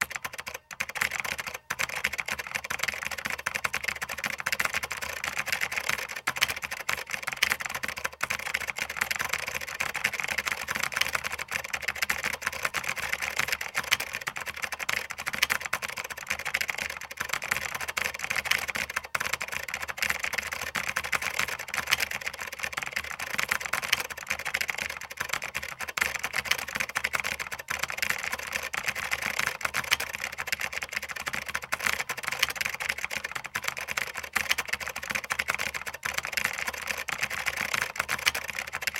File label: Computer Typing